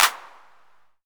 hand clap D key, Royality free clap tuned to the D note. Loudest frequency: 4355Hz; single hits one shots